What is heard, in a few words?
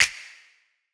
Snap